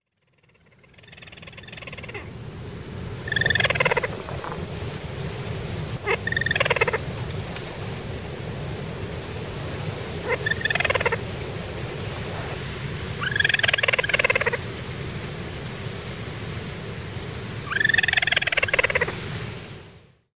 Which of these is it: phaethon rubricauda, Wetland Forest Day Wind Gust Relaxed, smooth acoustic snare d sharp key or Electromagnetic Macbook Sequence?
phaethon rubricauda